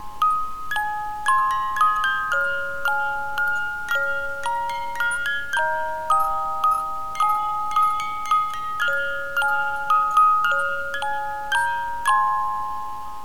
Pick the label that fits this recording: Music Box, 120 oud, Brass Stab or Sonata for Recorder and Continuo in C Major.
Music Box